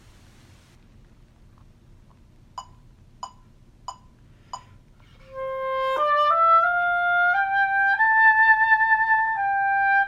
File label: oboe